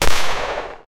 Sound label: an ac 130 gunship 40mm bo